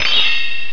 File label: Delete; Gerbils!; QuickDraw 3D 1.5